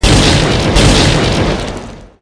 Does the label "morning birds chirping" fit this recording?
No